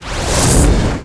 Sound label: ATTACK